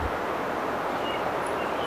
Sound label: owl